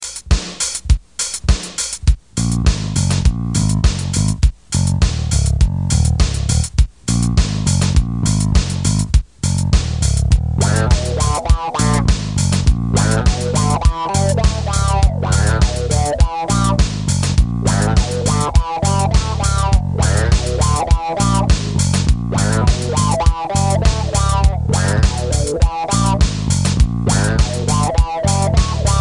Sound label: funky walk